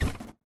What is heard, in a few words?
Bed Pound; Lewd SFX